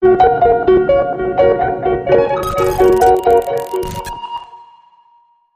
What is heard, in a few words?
Harps, Sting